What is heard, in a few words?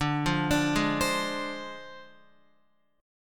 D-Minor 7th Flat 5th, D, Guitar, 4F, 4A, 5C, 5G, 5C, 6E; x,5,5,7,8,8; Dm7b5 arpeggiated